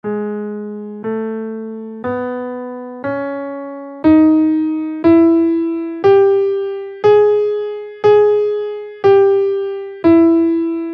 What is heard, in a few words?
neapolitan minor scale in Gm